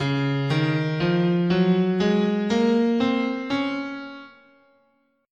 Db Ionian, scale

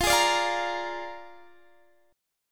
A sharp 7th F, Guitar

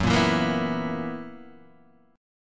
B Major 7th Suspended 4th Gb

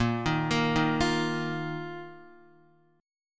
A sharp Suspended 4th A sharp, Guitar; arpeggiated; JGuitar; x,1,3,3,3,1

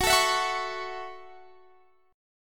Gb Minor 7th, Guitar Standard; x,21,19,21,19,21; strummed; capo=3

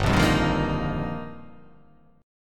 G Minor 7th Sharp 5th; Gm7#5; strummed; JGuitar

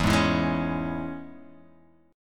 E 6th, down

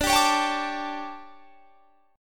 D Major 7th Suspended 4th, chord; DM7sus4 strummed

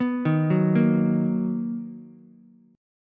B Major 7th B down Ukulele; arpeggiated; JGuitar

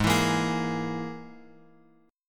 G sharp Suspended 2nd Sharp 5th, guitar chord; G#sus2#5 Chord {4 X 2 3 X 4} strummed

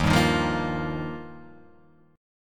Eb Major Add 9th, Guitar Open F; Ebadd9 strummed; 3,2,3,0,1,0; JGuitar

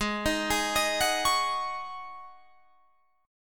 G sharp 7th Suspended 4th G sharp, Guitar Open F; G#7sus4; arpeggiated

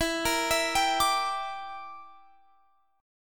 A sharp 6th Flat 5th chord

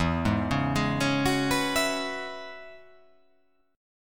E 6th Add 9th E down

